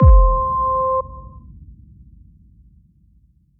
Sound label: timer end